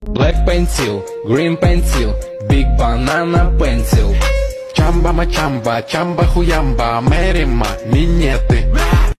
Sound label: big bass